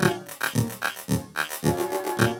LOOP, TECHNO; TECHNO-BOX; ELEC-LOOPS

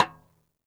xstick - snares off; JazzFunkKit

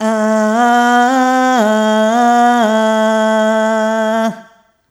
Rhythmic A

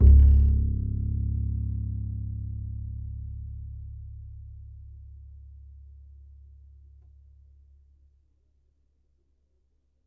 E, Upright Acoustic Bass

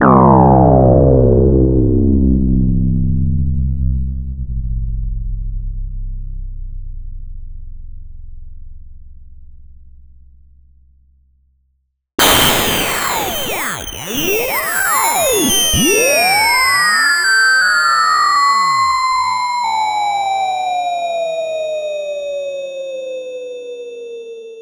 ARP 2600 FX

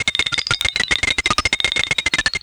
Hoist Machine; Sonic Foundry (Sony Creative Software); Myths of Technology

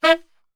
E 2 SAX SHOT

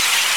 Crowd Murmur; SNES; Super RBI Baseball

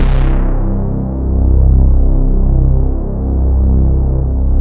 MKS BASS

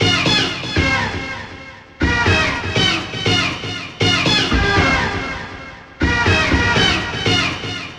Guitar Based; Guitars; Eclectic Electronica

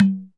PERCOLATE; Northstar; The Wizard VOL-1

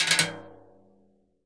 CASCARA, Synclavier Percussion

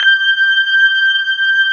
B3 HAM, Keyboards Old School

